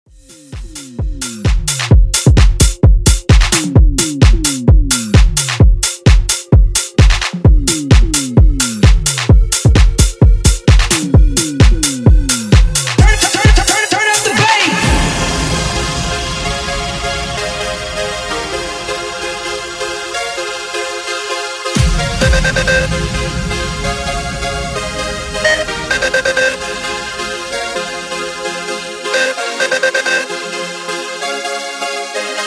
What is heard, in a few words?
Funk Bootleg 130Bpm